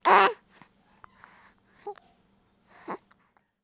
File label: Baby, Grunt, Short